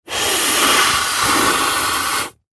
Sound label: Beach Ball Inflate, Foley, Single, Blow; Toy; BlastWave